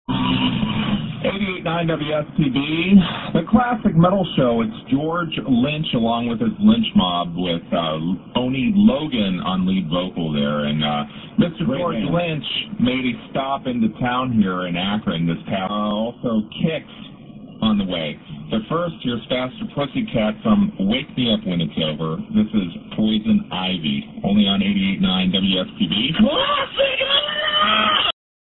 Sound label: Classic Metal